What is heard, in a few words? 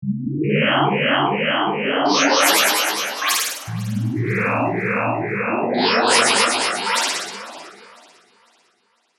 ZOOM; eShop